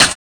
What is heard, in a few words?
RSS, 160 BPM